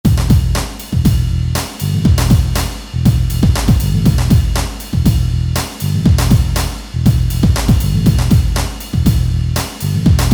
Rap Hip Hop

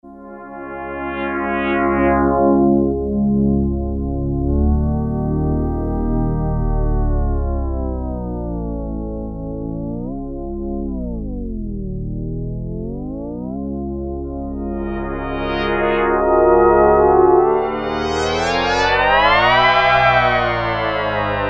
Yamaha DX7; EVOLUTION

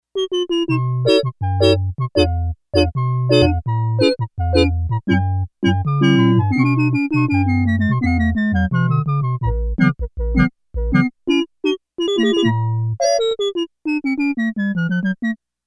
Percussive Organ

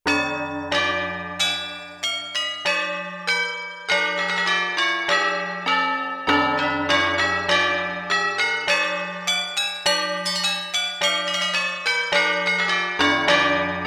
Tubular Bell + DX-Bell

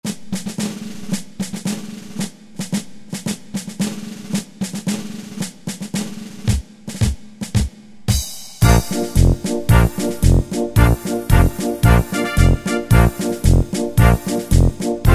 March, Yamaha QY70 Preset Styles

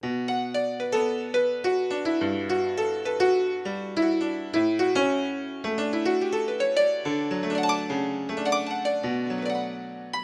Raw Data 88 Key, Yamaha CFIII Grand Piano; Yamaha TX16W

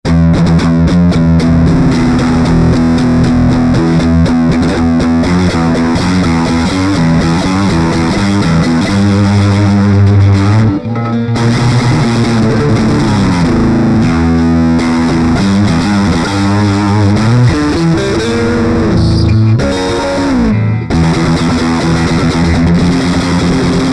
METAL PANEL

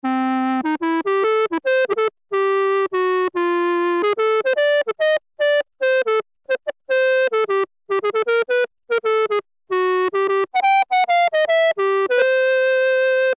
Clarinet, Roland Juno-106; Factory Preset

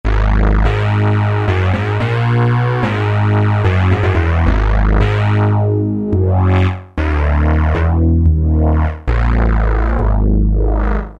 Bass My Pulse, E-mu Morpheus; Factory Presets

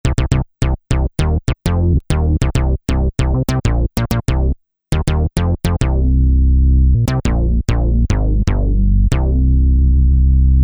Rez Bass

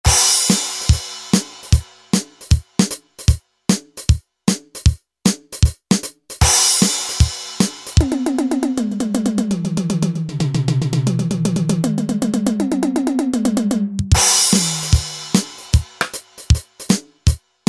Rockabilly, E-mu Pro-Cussion; Factory ROM kits

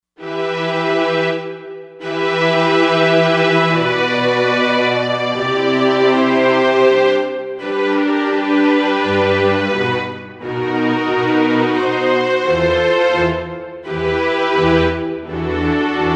Staccato

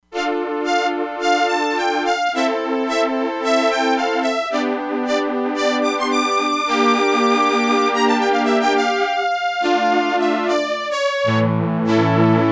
BOWED VIOLINS

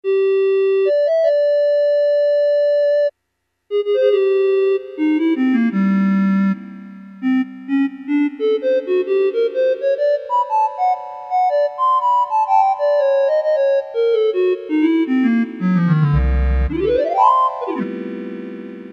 clarinet, Casio MT-68 preset sounds

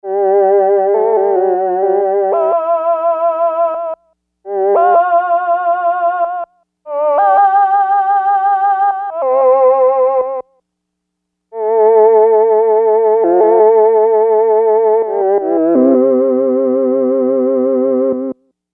Voice Male

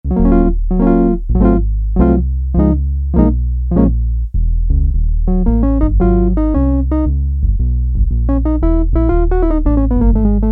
Jazz Guitar, Korg Poly-61M

